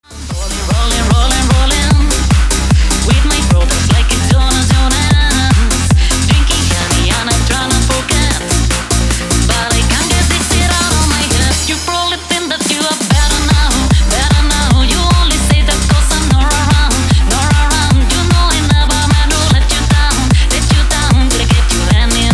hard EDM workout 150 bpm

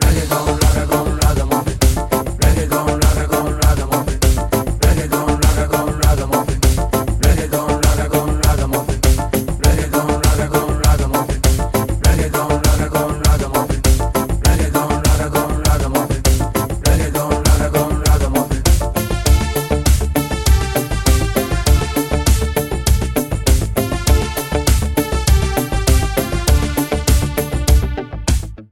reggae ragga

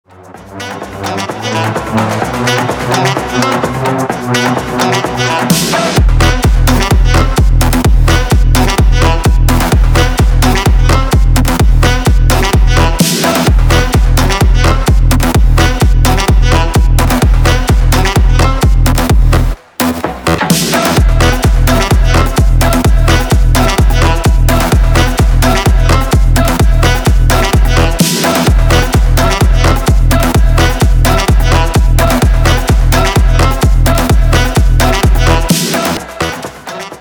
saxophone

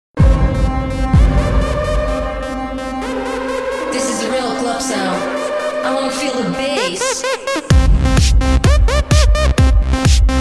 techno

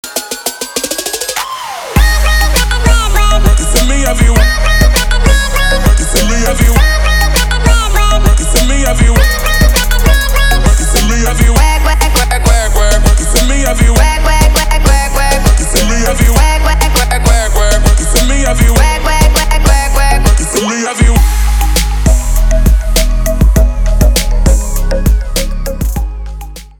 instrumental remix

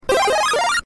power up; Mario

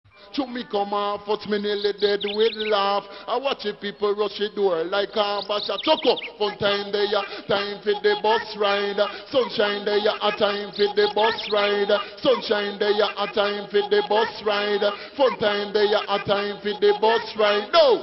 reggae

